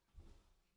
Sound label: TB GTR